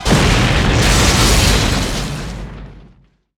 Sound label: Explo Large Spaceship; Destruction